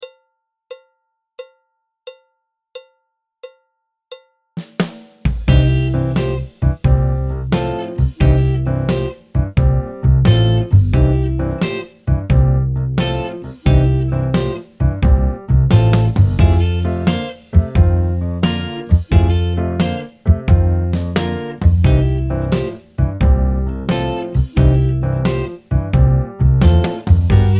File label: piano; Jazz Syllabus Level 3